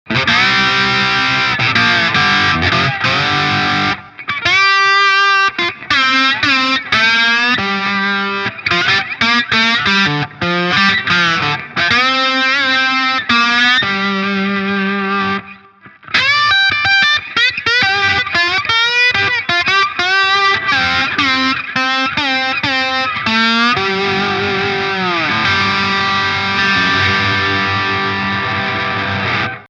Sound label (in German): Epiphone Firebird verzerrt Steg Slide